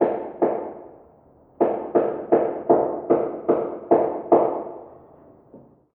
Random Double Hammers

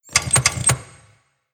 Fan Switch Jiggle

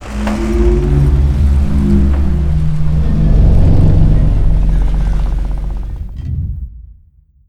Hydrant Up; Bahro

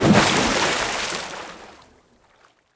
Deep Water Splash

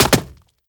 Stone Jump

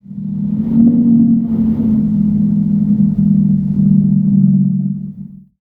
Whistle

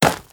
Dirt Run

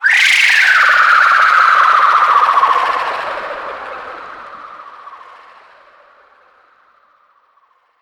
Pain Scream

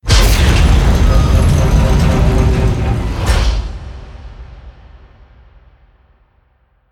Airlock Open

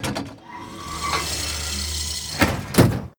Engineer Door Cl

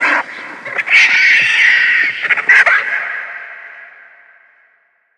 Desert Vulture

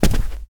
Rug Jump

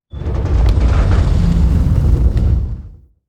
Tree Gate Close; moul

